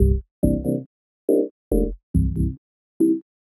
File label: synth 140 metaharmonics; Transmission-X